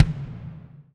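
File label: bass drum, Roland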